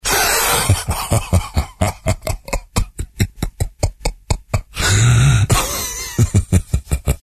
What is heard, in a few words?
cha cha; cstrike